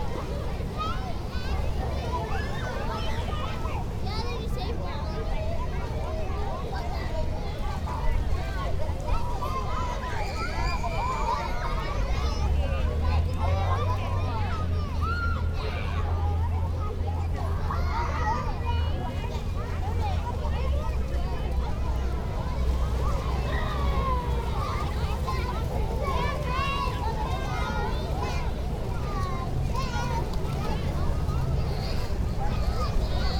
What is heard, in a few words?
Urban park day children playing; urban and suburban; boom library